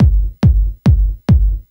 Tribal, Techno Drums; TechnoMusicMaker

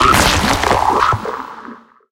triffid egg burst; darwinia

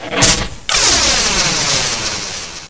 lightning

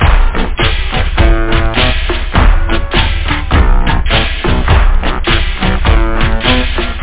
drum; da capo vol1; instruments

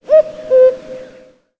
COCKOO; Volvo; VolvoV70

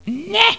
wrong

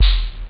small, drums snare; OctaMED SoundStudio V1

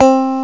slap bass; gmplay

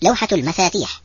keyboard

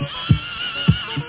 ting; sf2

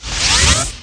VAPOR

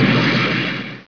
explode-simple; Vitamin

